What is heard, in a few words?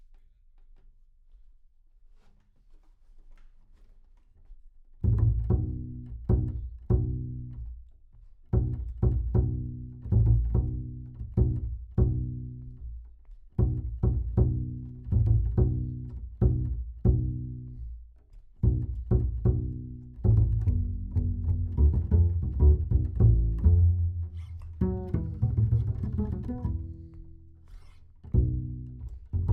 Bass